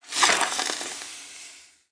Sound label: make weapons